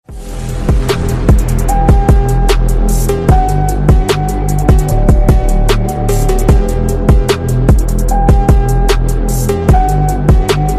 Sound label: instrumental